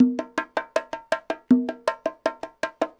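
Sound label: congas bongos 80 bpm, latin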